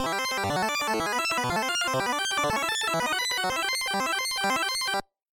casino spin

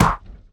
battle punch

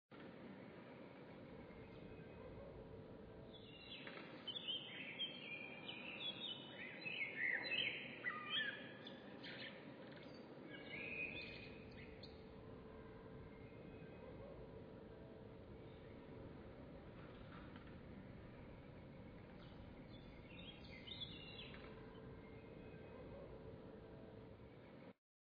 ambience location

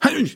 voice male hit

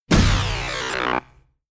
battle defender critical; hideout

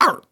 voice male hit

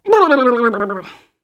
voice female critical

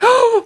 voice female hit

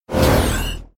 battle attacker attack critical; hideout

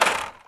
ui button click disabled

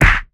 battle punch

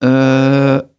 voice male critical

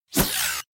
battle attacker attack; hideout

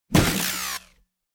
battle wall hit; hideout

